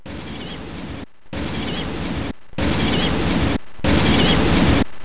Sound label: plover